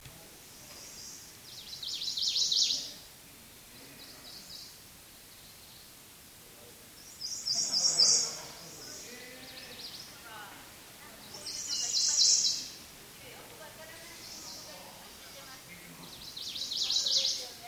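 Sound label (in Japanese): iijimamushikui, Ijima's leaf warbler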